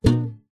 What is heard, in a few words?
musical guitar acoustic nylon grab neck strings